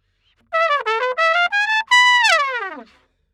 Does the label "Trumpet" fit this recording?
Yes